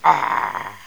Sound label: human hit; siberian journey